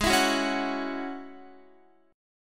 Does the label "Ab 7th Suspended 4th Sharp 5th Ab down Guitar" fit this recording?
Yes